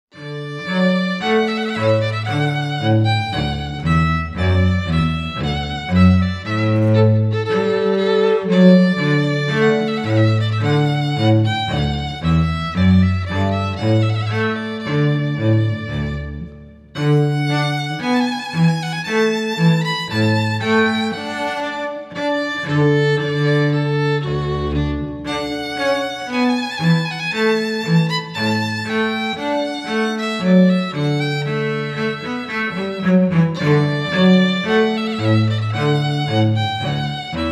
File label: trumpet voluntary, duo